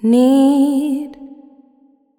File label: Need, Female Vocals, Wet; Advent Calendar 2017 Day 1